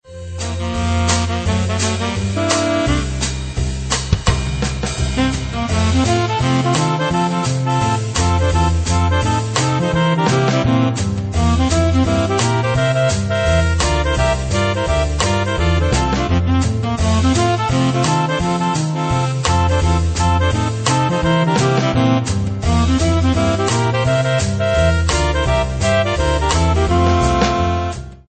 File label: Swinging Saxes; Saxophonisten